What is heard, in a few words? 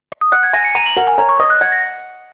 message alert; Sony Ericsson; K700i